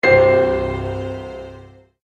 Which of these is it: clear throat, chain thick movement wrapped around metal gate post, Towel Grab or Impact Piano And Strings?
Impact Piano And Strings